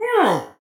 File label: Grunt Hit; BombKing